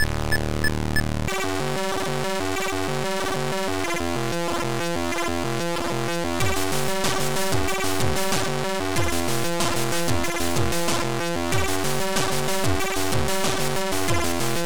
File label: Drums